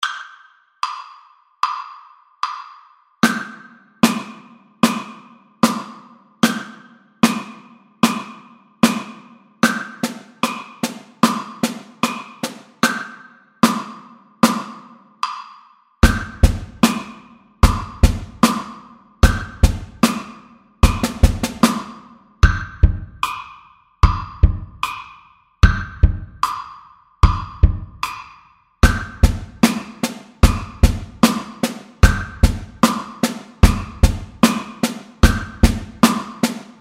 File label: rockin the snare